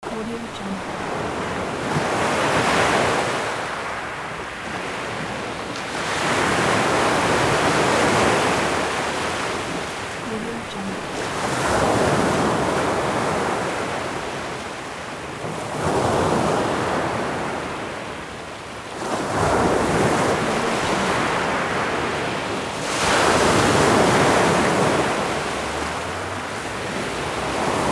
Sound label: waves